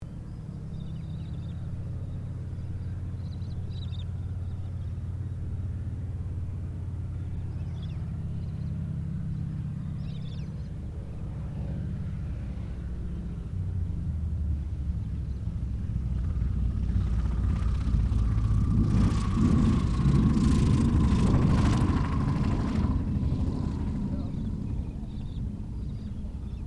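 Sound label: AIRCRAFT YAKOVLEV YAK-52 LAND, Russian Training aircraft; Airplanes (Sound Effects:Vehicles)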